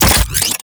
Briefcase Launcher Reload Passive Complete, Weapons; Warframe; Corpus